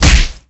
box hand hit

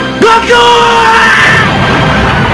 boom; jihad; cstrike

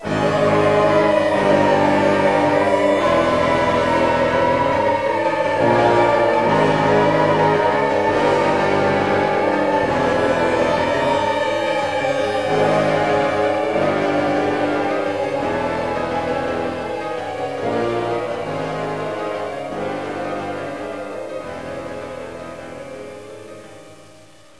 Music Rescue Late; bog nomercy

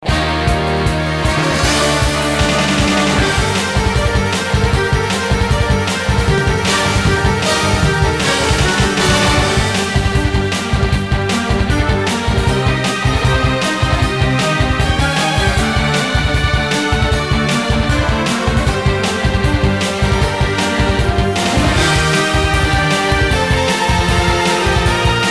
music swarm; zombie escape

